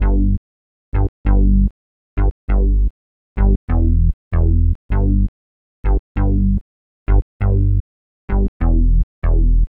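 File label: bass 99 G; Best Service ProSamples vol.34; U know How We Do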